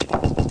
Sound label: Bumps Dull